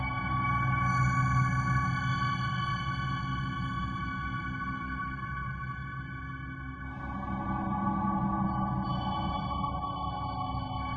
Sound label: crystal, synth; video game; ambient; source engine; portal2